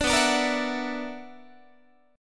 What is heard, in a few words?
B Minor Major 11th, BmM11 chord, Guitar; strummed; JGuitar